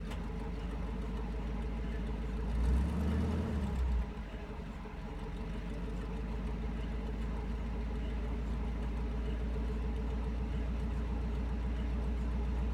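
stuart m5a1 exterior engine dies attempt to start, Light Tank; Pole Position; stuart m5a1 t16; MKH60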